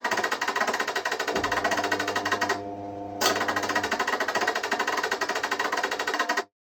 Laundry Machine

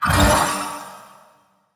Wind Up

